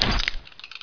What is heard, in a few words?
crouch enter